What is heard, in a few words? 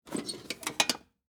Lunch Box Open, Foley, Lunch, Box, Lid, Open, Metal, Case; Lids; Objects; BlastWave